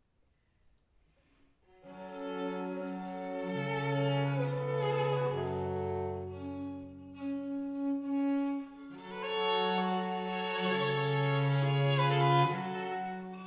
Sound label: Arrangement for two violins, viola, and cello; ninette a la cour